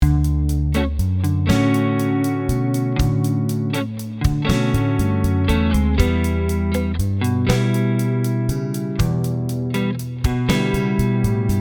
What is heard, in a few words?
Dmaj7 Em7 Backing Track